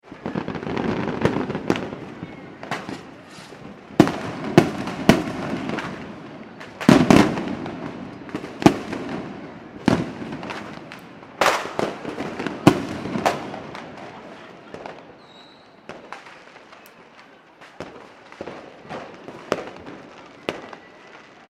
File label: fireworks mixture urban, Fireworks (Large And Small) In City/Town; Mixture Of Large And Small Fireworks Close By And In Distance; Fourth of July; McKinneySound